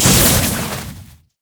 tantrum; LoL; badrobotamumu

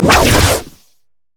stacked deck; LoL; twistedfate

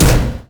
axe throw; olaf pentakill; LoL

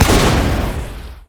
charged shot; LoL; graves

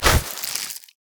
spider emote death; Elise

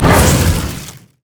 brutal strikes; LoL; glacial malphite

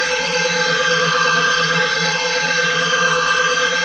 stair laser loop; odin

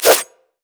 crit attack; Zed

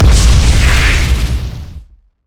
charged shot; LoL; graves

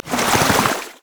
q blast; LoL; syndra

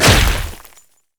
basicattack dragon; LoL; shyvana

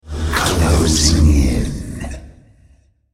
ultimate effort; Thresh

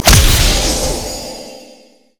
pick a card blue card; LoL; twistedfate

